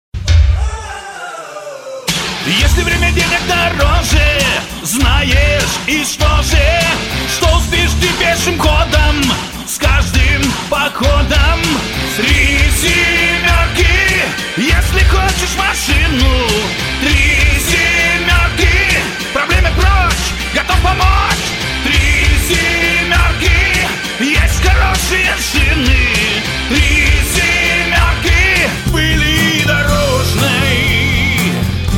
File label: vokal